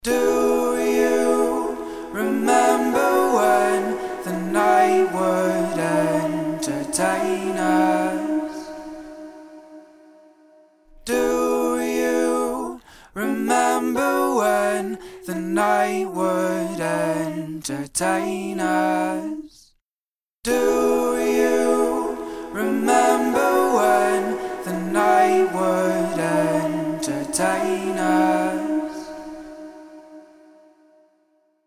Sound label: Male Vocals Modern; SP2016